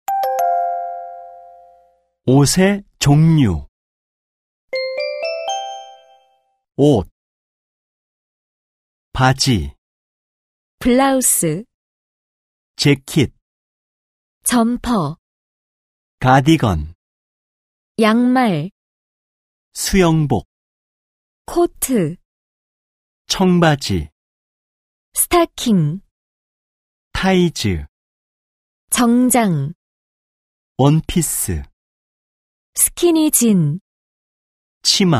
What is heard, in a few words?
K-POP